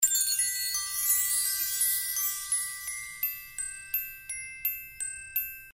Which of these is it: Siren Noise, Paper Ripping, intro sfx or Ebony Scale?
intro sfx